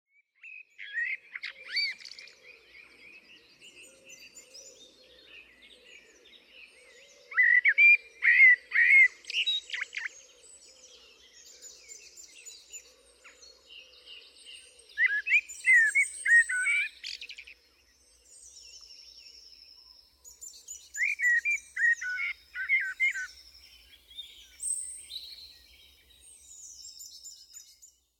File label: Evening Forest Birds and Stream Song